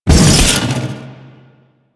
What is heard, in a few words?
Jump; Warframe; DE; Sentients; Erra; Foley